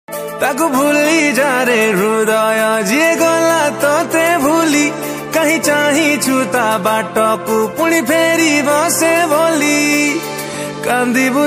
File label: Odia ringtone